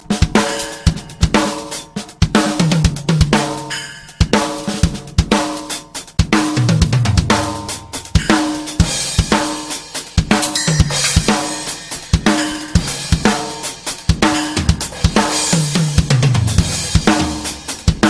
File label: Drum Solo